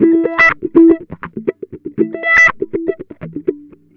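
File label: wah funk; Jazzistic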